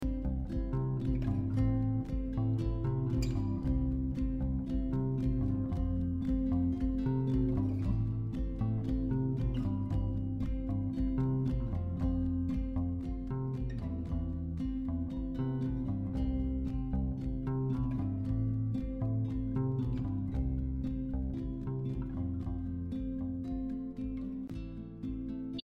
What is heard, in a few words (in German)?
dorm draussen musik; life is strange